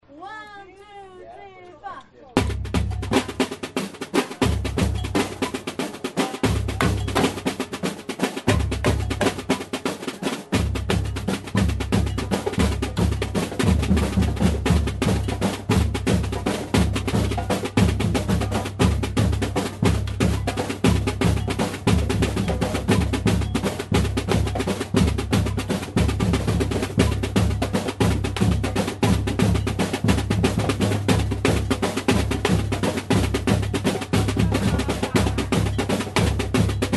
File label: Afrobeat Coco, samba; Carnaval; Carnaval2105